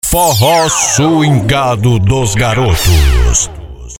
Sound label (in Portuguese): vinheta